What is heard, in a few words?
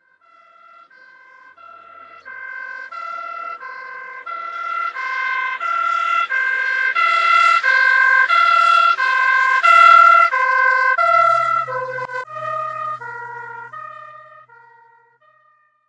foreign siren; amb elements; modern warfare 2